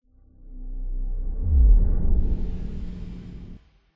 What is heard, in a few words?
Wormhole Flutter